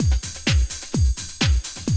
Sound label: D3 BREAK